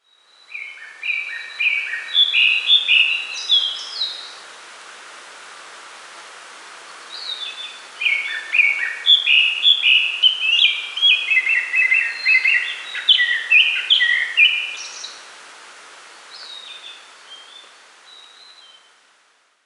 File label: bird